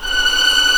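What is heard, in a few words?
F#6 tremolo p violin left, violins tremolo; East West; The Ultimate String Collection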